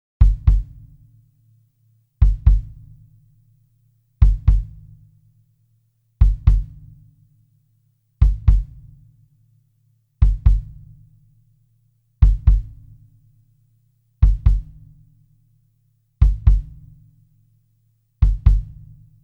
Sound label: Kick